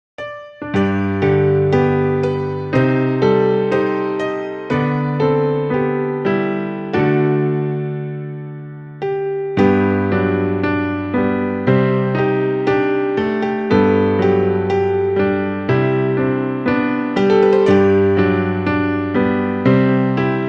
Piano Cover; Pony Music Archive

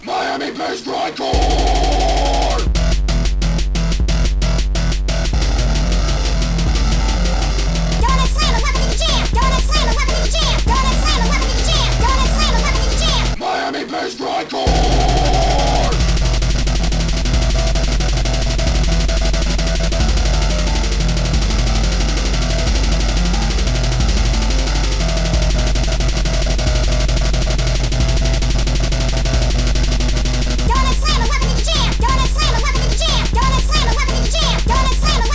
Miami Bass Grindcore; Pony Music Archive

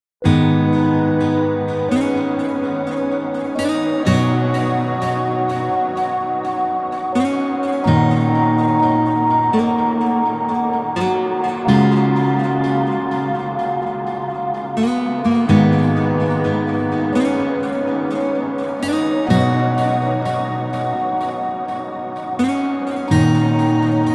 Id with guitar; Pony Music Archive

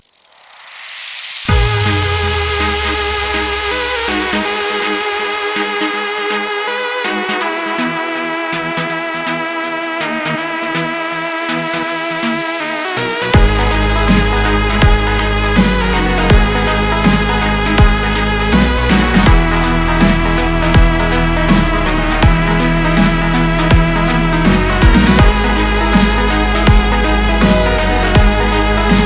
Instrumental; Pony Music Archive

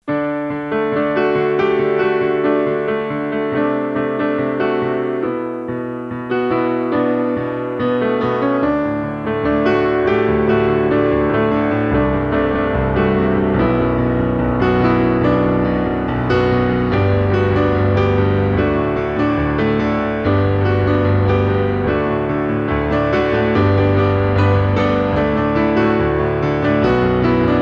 Piano; Pony Music Archive

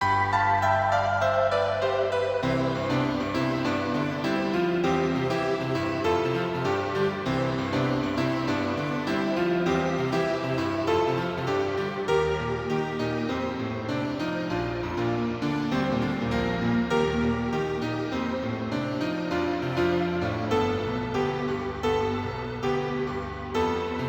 Piano Cover; Pony Music Archive

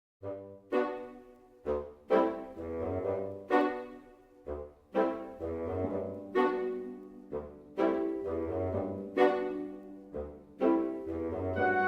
Waltz, Orchestral Instrumental; Pony Music Archive

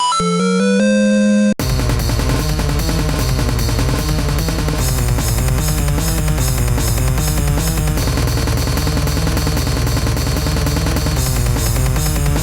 Instrumental

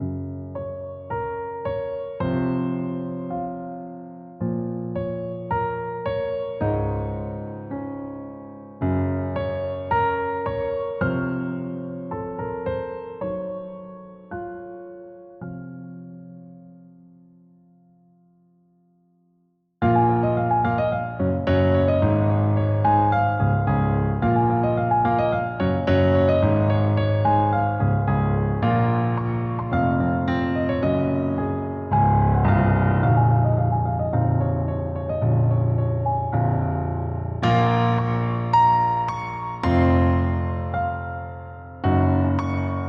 Piano Cover; Pony Music Archive

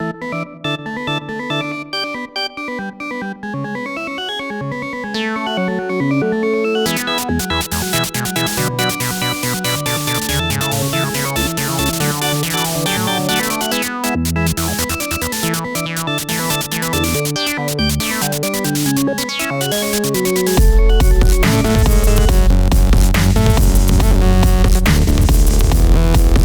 Instrumental; Pony Music Archive

